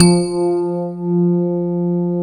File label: GRAND PF F 2, AKAI S-Series